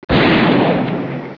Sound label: awp; jb nuke csbr